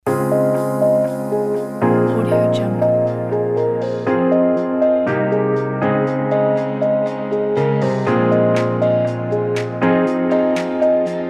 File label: inspiring upbeat corporate